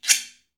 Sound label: Guiro Wood; Club-50 - Foundations Roland